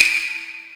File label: normal hit whistle